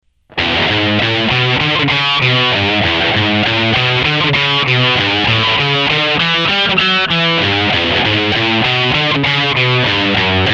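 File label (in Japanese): strat rear, Fat drive (3) リアのハムバッキング+ギターのボリューム8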